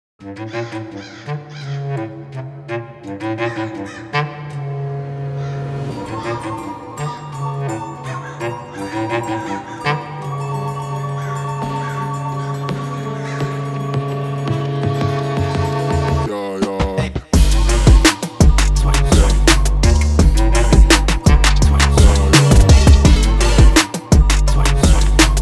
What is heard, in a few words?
HIPHOP